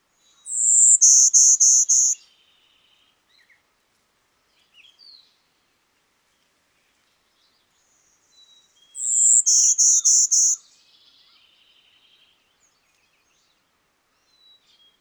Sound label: warbler call female, golden winged warbler female